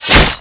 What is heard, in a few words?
Sword Line; nox